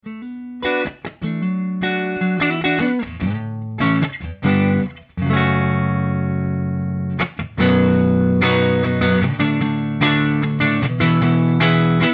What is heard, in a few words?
clean